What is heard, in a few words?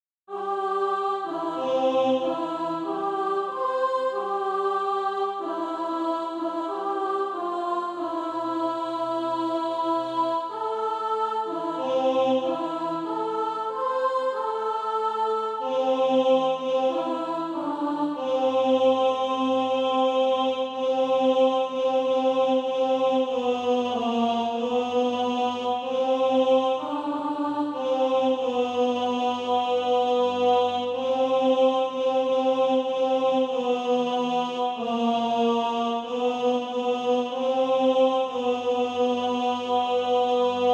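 alto, Practise Music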